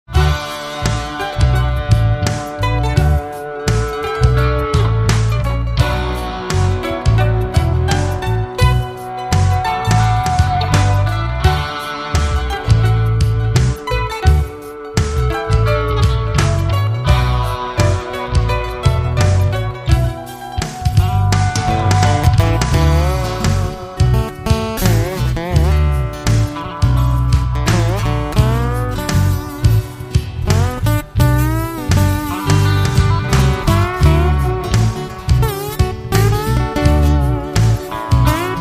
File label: Irish Bird Song